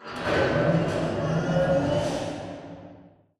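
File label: Robot Arm Left Deliver Retract Distant; Boomtown; Titanfall 2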